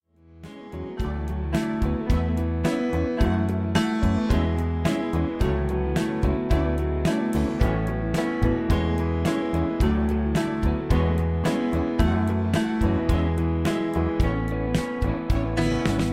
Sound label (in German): Halleluja Playback